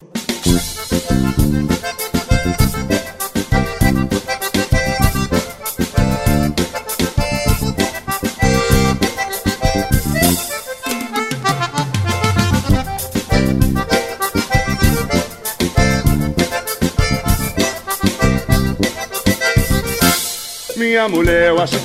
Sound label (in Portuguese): marido raparigueiro teclados vanerao